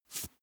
makeup stroke apply single; haus-garten; badezimmer

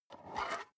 storage lid slide open soft, box; objekte

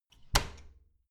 bathroom cabinet door creak close heavy

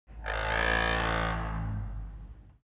wood door old creak

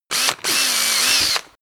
drill classic sequence short; bohrer; soundpool

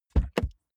car bmw e46 interior door front open fast; Cars

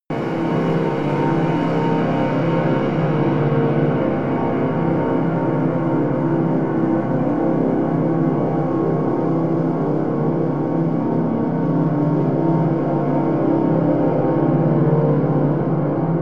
drone busting

